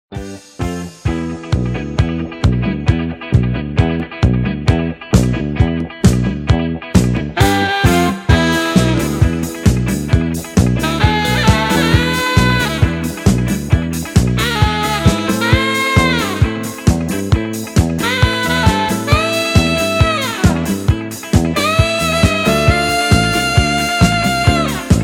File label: disco